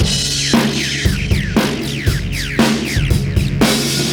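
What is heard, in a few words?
fx, Beat Loops, 116 bpm; Zero-G; Monster Beats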